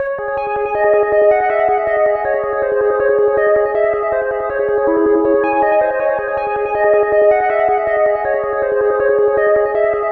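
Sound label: drum loop; ゆめ2っき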